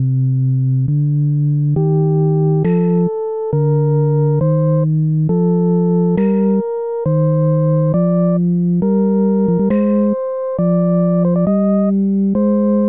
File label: sad history, chiptunes